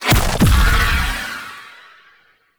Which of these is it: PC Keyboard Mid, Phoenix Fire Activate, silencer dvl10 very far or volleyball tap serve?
Phoenix Fire Activate